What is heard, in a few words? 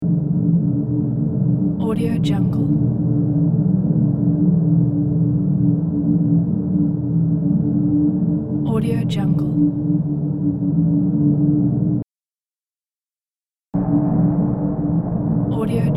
eternal ethereal void soundscape